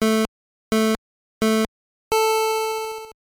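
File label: phone notification sounds in 8-bit style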